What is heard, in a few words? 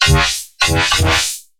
bass hit interface; Virtual Riot